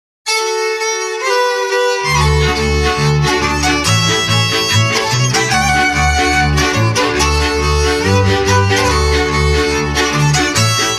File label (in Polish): Polka